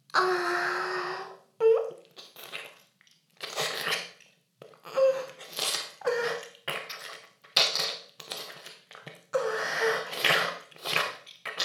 フェラ音声; ダメなメイド

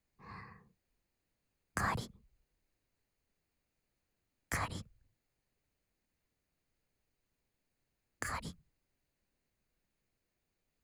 乳首責め（かりかり・左）（SEなし）乳头刺激

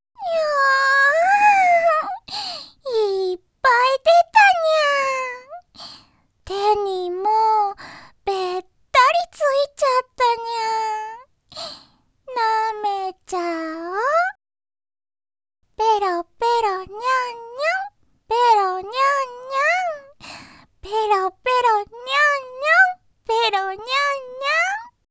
なめちゃお

